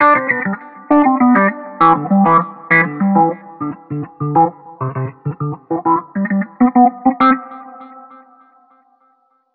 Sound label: Vintage, keys